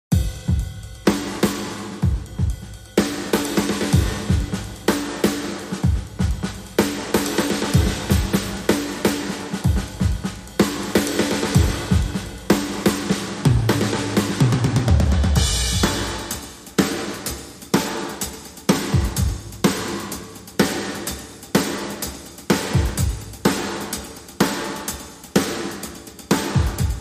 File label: Kit Only